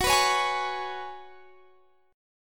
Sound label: Gb Suspended 2nd Suspended 4th, Guitar Standard; Gbsus2sus4 strummed; x,21,21,18,21,21